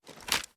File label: sm beta inspect; gmod